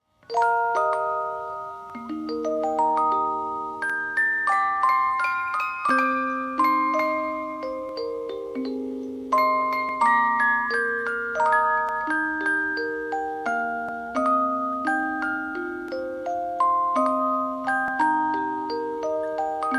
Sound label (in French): 30 lames japonais, boites musique